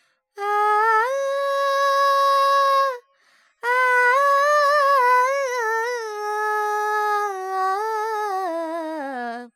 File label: Phrygian, Vocals, Carnatic Female, Alaps, Dry